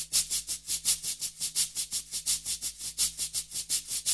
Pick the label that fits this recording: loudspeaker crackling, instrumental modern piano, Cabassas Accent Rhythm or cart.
Cabassas Accent Rhythm